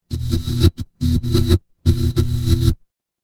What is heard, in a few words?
fire electric hum